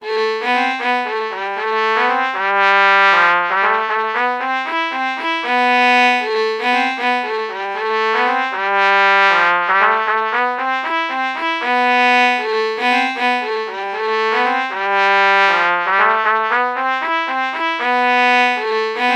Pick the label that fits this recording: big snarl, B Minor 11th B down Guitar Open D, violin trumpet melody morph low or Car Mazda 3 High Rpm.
violin trumpet melody morph low